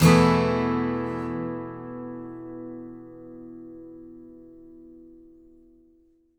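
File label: acoustic guitar E sus down, Whole Lotta Country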